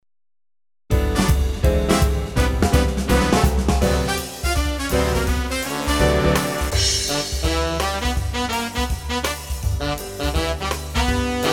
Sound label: BIG BAND Q STEP, accordion